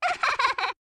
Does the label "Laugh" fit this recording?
Yes